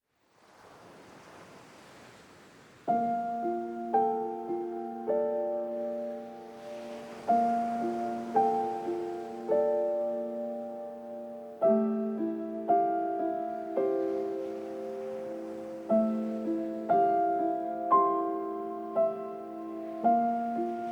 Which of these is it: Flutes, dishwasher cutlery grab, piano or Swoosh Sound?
piano